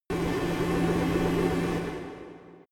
space construction machine

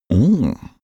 male enjoying hmm

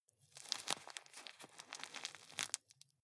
juggle squeeze

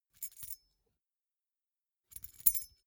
key ring small rattle air soft

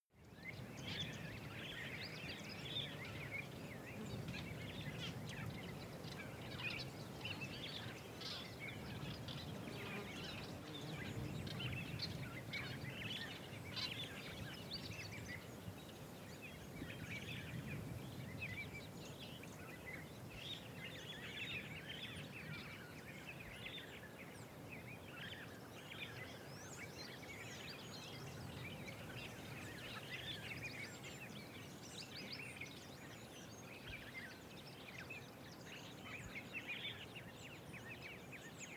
african bush midmorning birds

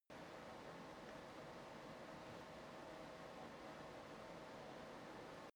workshop garage

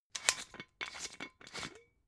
glass bottle massive open scratch

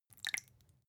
glass drop

